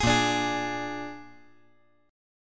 A sharp 7th A sharp

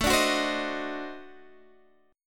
D 7th Flat 9th A, chord

